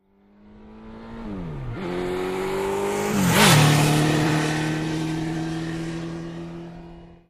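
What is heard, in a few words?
Motorcycle, Kawasaki 750, By Fast, Close Up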